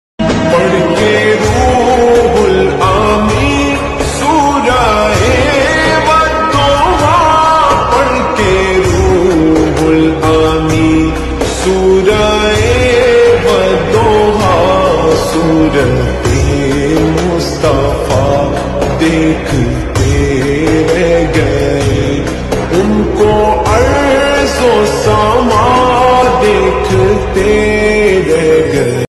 muslim ringtone

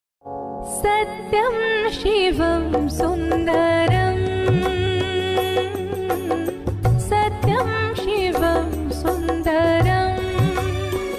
bhakti ringtone; mahadev

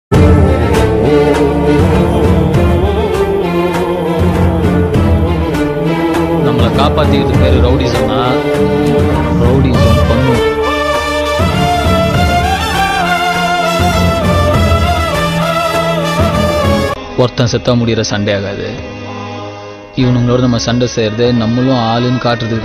mass bgm; vada chennai; tamil

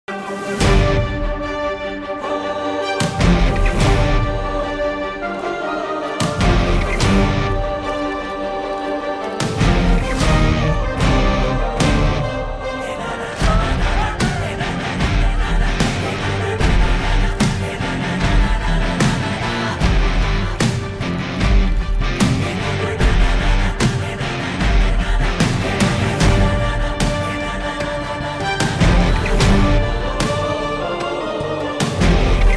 mass bgm ringtone; sarkar; vijay

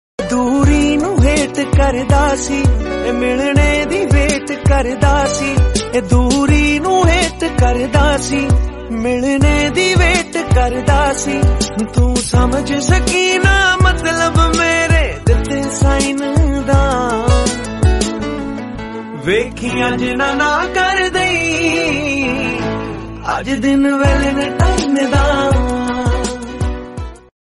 romantic ringtone punjabi song ringtone

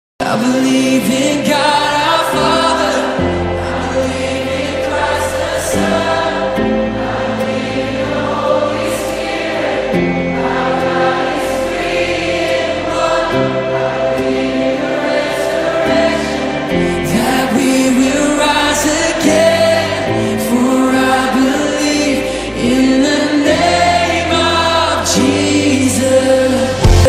christian ringtone, english christain song; jesus

